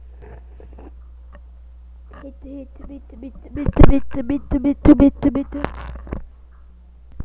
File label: beat